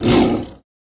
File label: white ape damage, monster